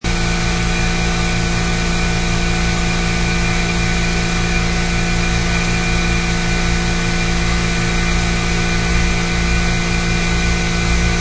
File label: Noises from industrial basement.; Basement Sound Effects; Household